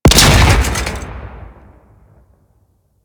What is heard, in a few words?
Target Explosion B; Warframe; Ambience; Tenno Challenges